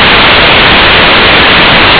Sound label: 4kHz white noise